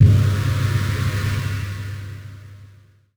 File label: searing chains cast; weapons; dota; ember spirit